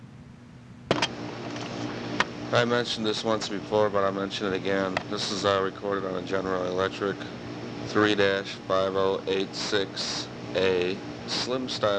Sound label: Audio, GE Recorder Type; spoken introduction; Arcadian Submission Tape